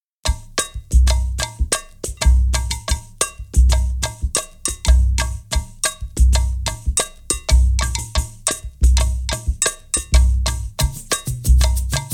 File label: Brazilian Theme